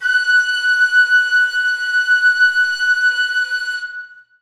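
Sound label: flutes sustain f#3; Sonatina Symphonic Orchestra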